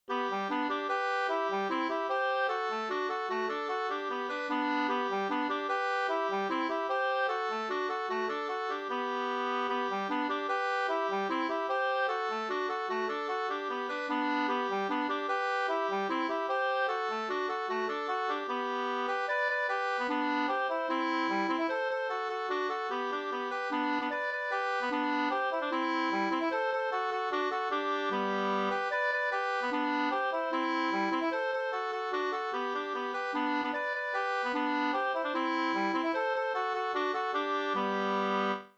hambopolkett; Priffe i storstugan e Bror Andersson Sd 2st

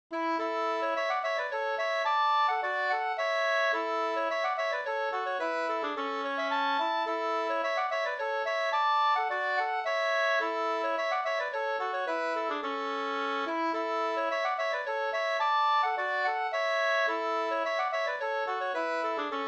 polska Bingsjopolska Stamppolskan; e Hjort Anders 2st

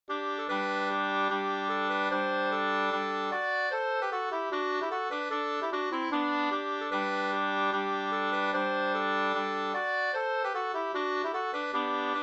reinlender; fr Eidskogen o Eda Vr

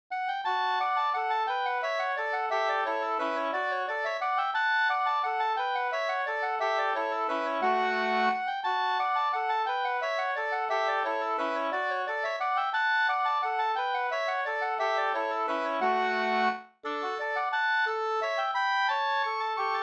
vals; Winbergs vals; Martin Rodin

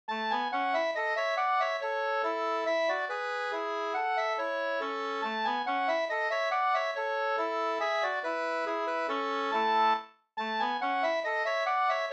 hambopolska Polska, 2st

